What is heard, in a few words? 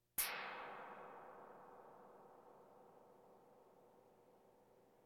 Percussion Bongos Tympani rolls Gongs Drums